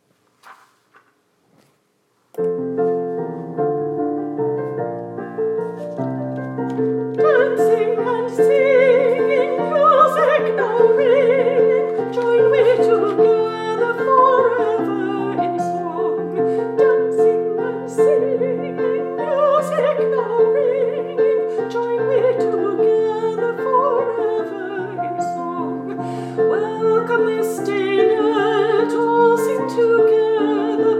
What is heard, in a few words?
Dancing and Singing Soprano